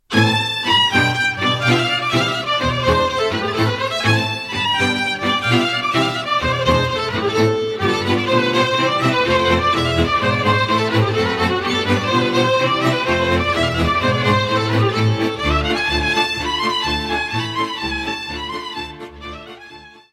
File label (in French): Manintel, Roumanie